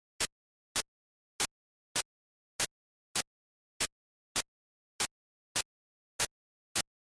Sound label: example of the perceptually regular binaural sequences; for the 2 patients